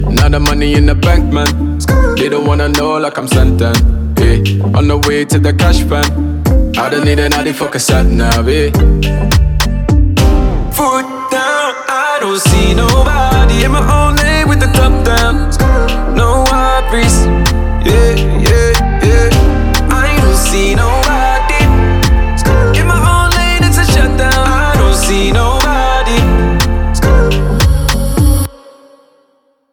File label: English Songs Ringtone